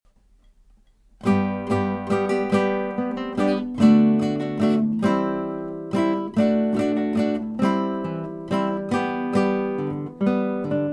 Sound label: Jig duo; Gitarrenmusik